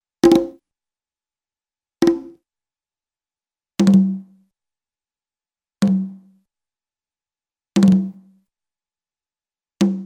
Short Conga Drum Hits African Drum Beats, cartoon; Hollywood Edge; Cartoon Trax

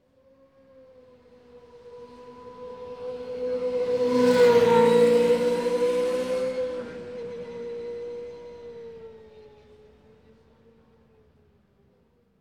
Crowds Race cars Baseball

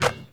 Basketball Net

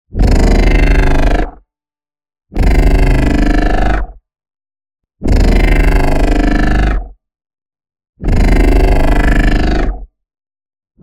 NEON BLAST Tech Growl; boom library

